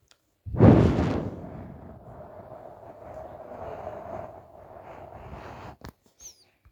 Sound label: Wind; Ambient